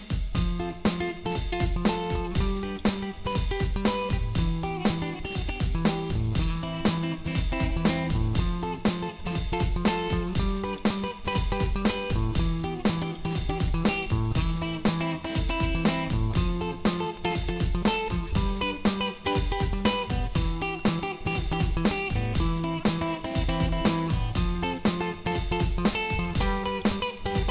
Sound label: three finger acoustic